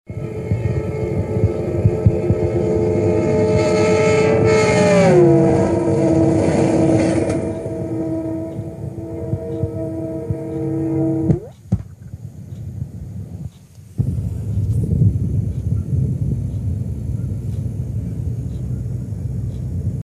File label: Metroliner Bush River Bridge